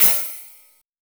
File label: Ride set, Drum and Bass; Ride Set 2; Instrument Samples